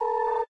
ringout, windows 7; operating systems

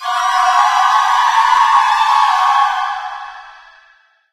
loud angry little witch, music; Left 4 Dead 2; Source Engine

